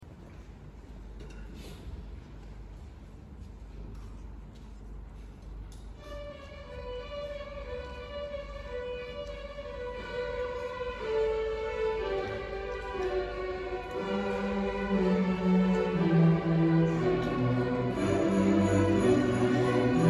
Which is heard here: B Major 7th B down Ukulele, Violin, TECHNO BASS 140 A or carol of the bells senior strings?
carol of the bells senior strings